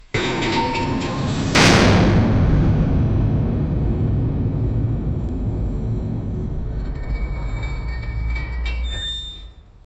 short sound of a massive

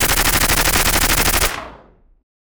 a clean scifi machine gun

